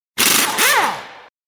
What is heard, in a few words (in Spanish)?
Llave de Impacto